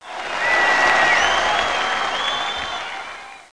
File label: People; MegaPong